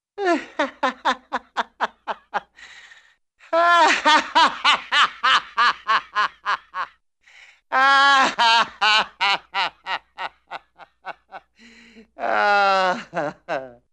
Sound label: Man Laughs Hilarious Build Then Dies At Tail; Cartoon; Hollywood Edge; Cartoon Trax